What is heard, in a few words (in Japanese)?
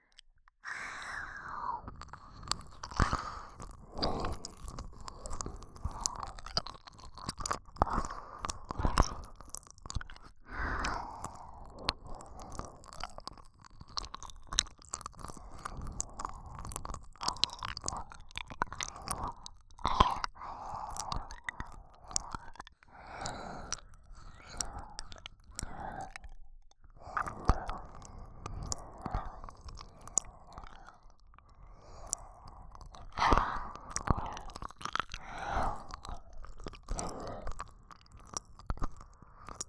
耳舐め